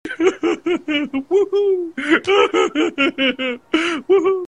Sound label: woohoo sob